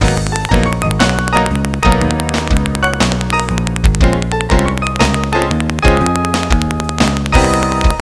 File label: Chorus A, Jazz; Sound Factory